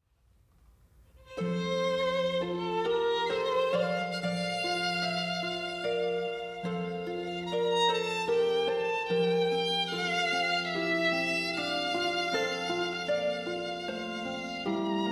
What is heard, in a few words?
Minuet Duet of Harp and Violin; C. W. Gluck, Minuet from Minuet and Dance of the Blessed Spirits